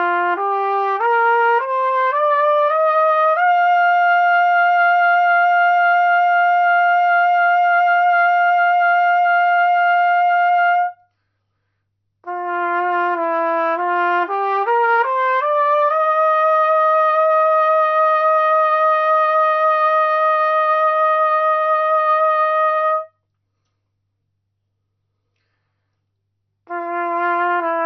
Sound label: trumpet 6KHz